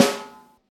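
round snare drum A key, snare tuned to the A note, Loudest frequency: 1904Hz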